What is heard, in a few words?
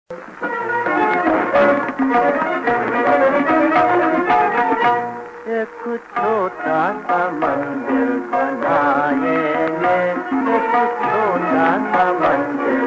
Gramophone Singer